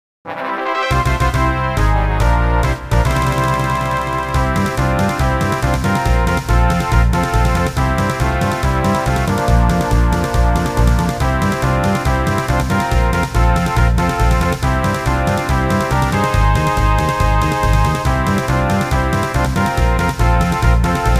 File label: fanfare; 勝利のファンファーレ; FFおなじみのファンファーレな曲。; ff4